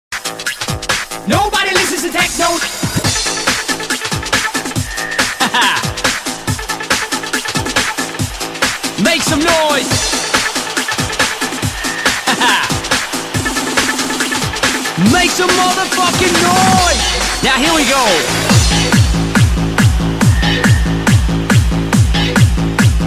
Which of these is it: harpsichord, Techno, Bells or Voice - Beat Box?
Techno